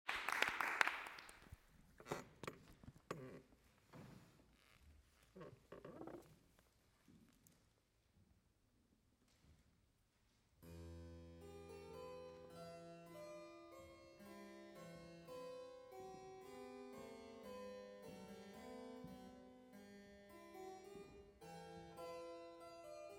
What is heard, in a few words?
harpsichord, concert performance; The Sun Dappled Forest